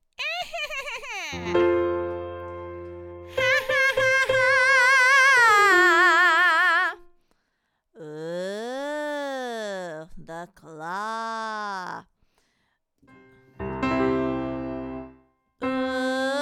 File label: Witch Cackle, exercises for breathiness